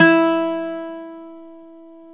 BOUZ PIAN E 2

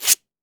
SANDPAPER B2; AKAI S6000 CD-ROM - Volume 5

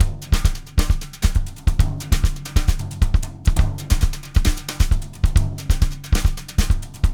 samba, brush loops

